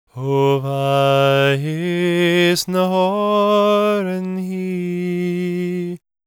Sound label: GAELIC, World Voices; ILIO; Vocal Planet